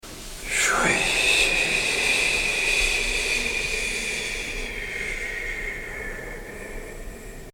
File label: Six Healing Sounds Qigong 吹 CHUI